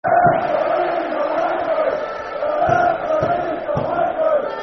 The Referee's A Wanker, Football Chant, N. Ireland; N. Ireland N.i.f.a